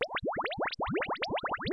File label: bubbles; physioex9